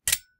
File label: sword Stone; Foley; Kenney's Sound Pack